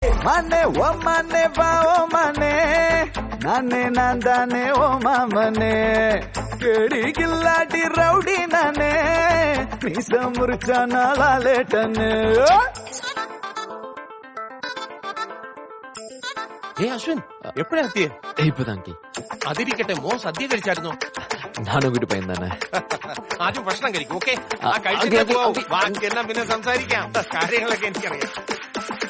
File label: Tamil Song